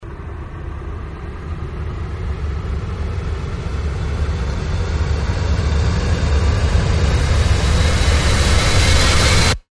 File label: riser brute